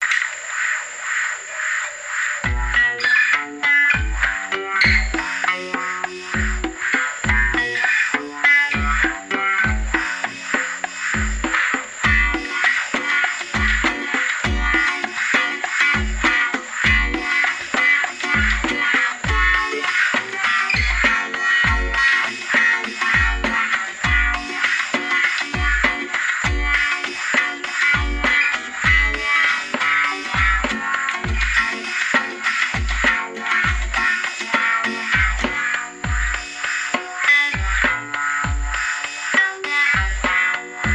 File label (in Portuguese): eletro acustic bolero